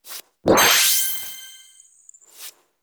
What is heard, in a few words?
Tableturf - Upgrade